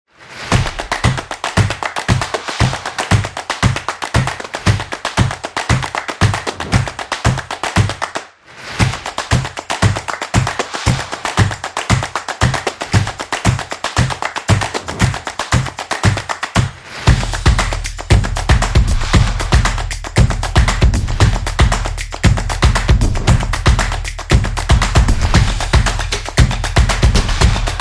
Upbeat Intro; snaps and claps

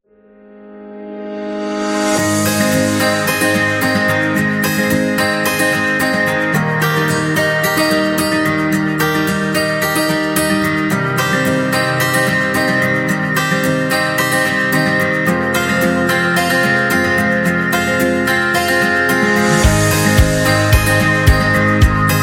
Inspiring Acoustic; corporate background

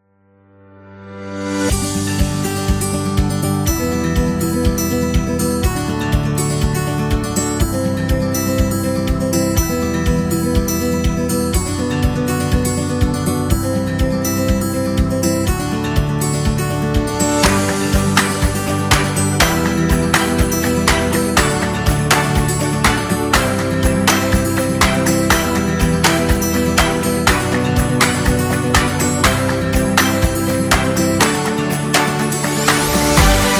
Upbeat Adventure; corporate background

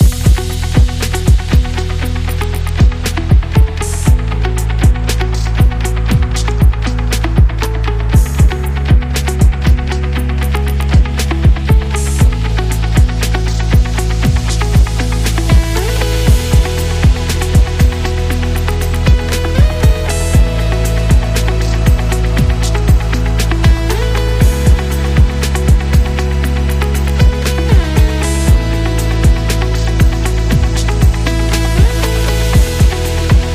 motivational corporate music

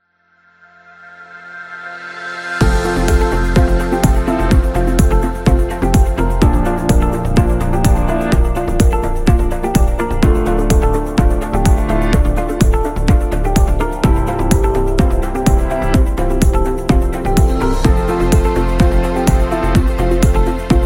Corporate Inspiring; corporate background

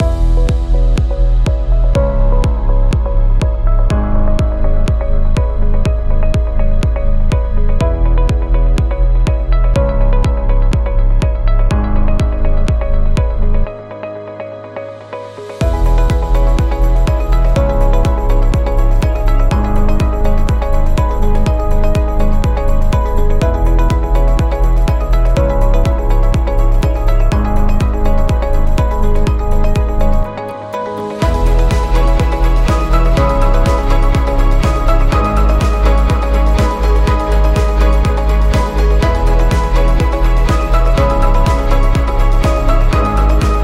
Corporate Motivational Loop; explainer

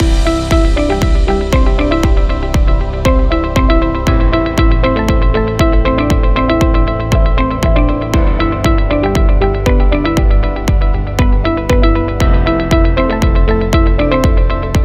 Corporate Loop; inspirational motivational

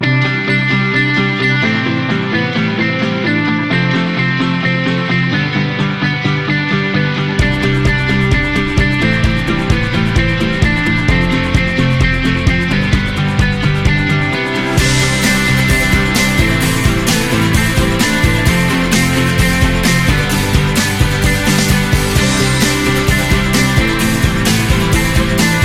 Pop Rock; explainer

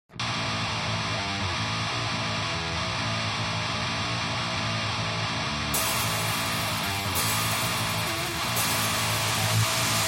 Metal On; sports training energy

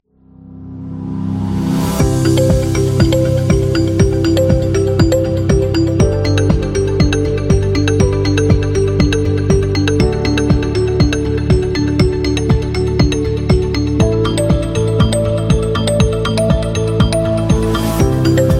Corporate Emotional; conference

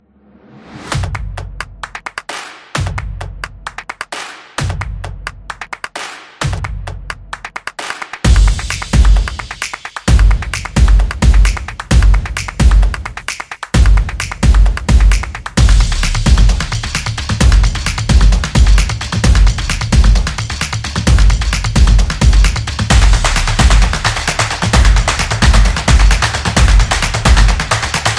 Upbeat Drum Beat; high energy

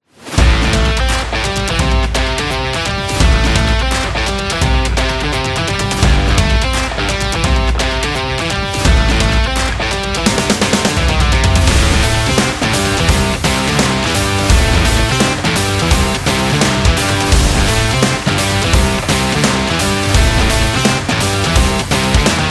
Action Rock Trailer

